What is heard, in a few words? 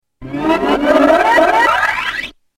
reset; css; myo achievements